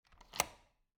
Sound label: Cabin, front door, deadbolt, lock, latch, thick, close, wide; Eiravaein Works; Vaeyan IV